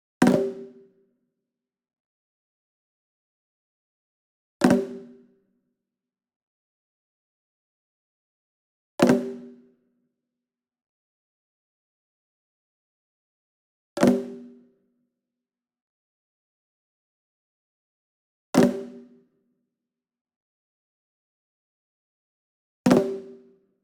ENSEMBLE DRUM Shime Daiko And Chinese Tom Flam Near; boom library; Cinematic Strikes Construction Kit